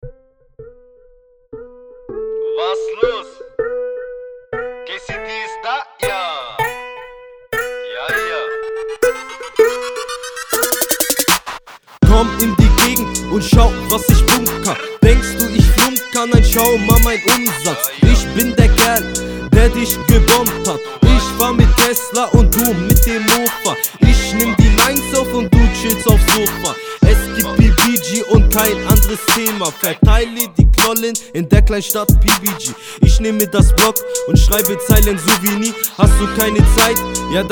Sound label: Beat; Rikers